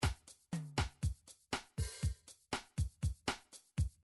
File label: midi drums bossa